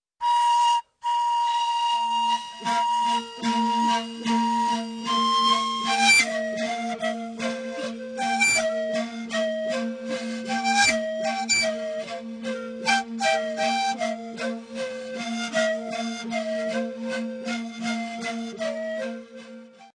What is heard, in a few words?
Blow Pluck Hit